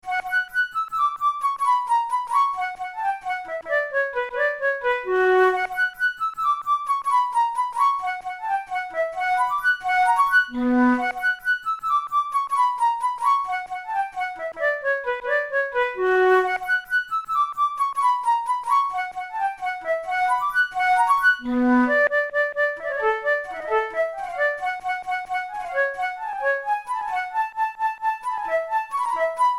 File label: violin concerto rondo; Paganini; violin concerto no2; La Campanella